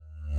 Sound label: thoing backwards; angband